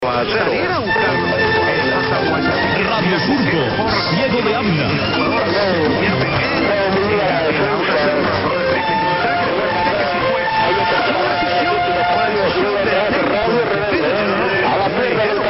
ID; 710 Radio Rebelde w Radio Surco

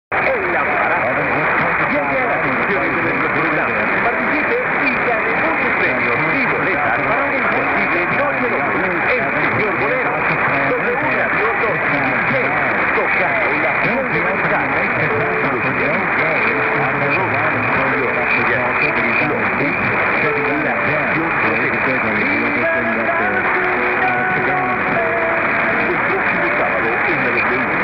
COLOMBIA Cali; HJCX-W Radio

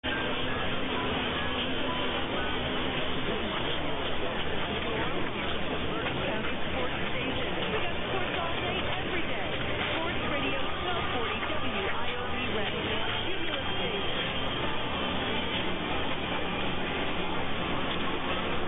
Reading PA; 1240 WIOV

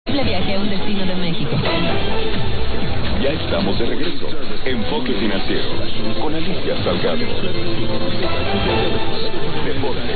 Radio Mil Mexico, radio

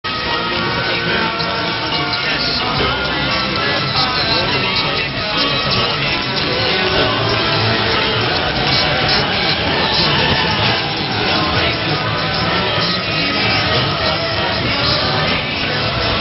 music fanfare

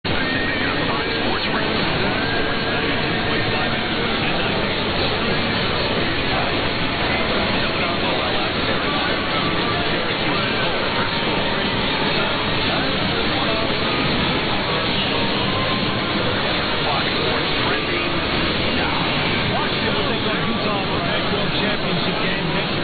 1490 WOLF Syracuse NY

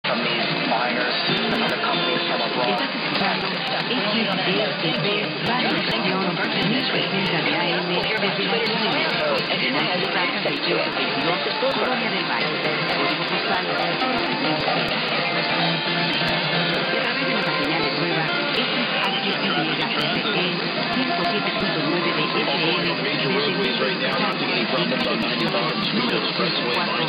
Radio Educacion Mexico ID and FM call, radio; XEEP; XHYRE

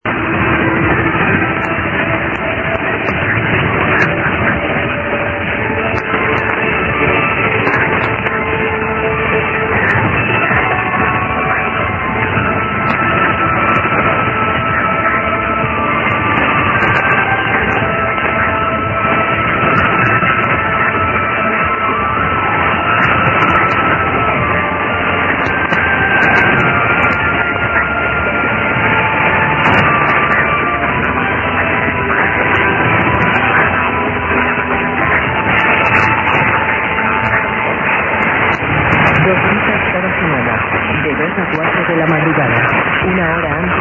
SPAIN great signal; RNE1

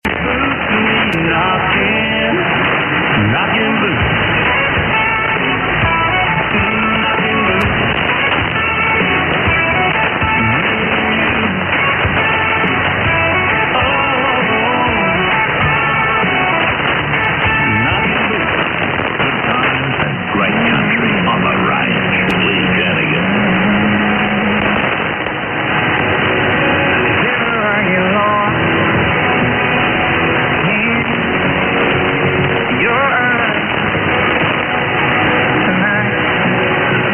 country music; 531 4KZ Australia The Range

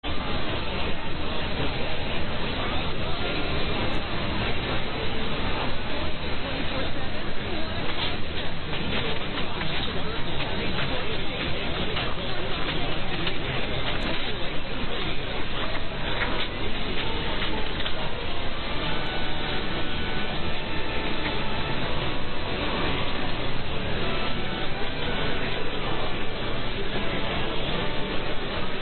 Reading PA; 1240 WIOV

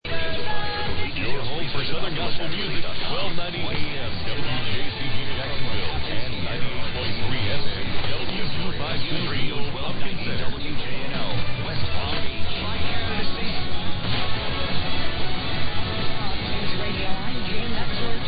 southern gospel; 1290 WJCV Jacksonville NC; WJNO West Palm Beach FL

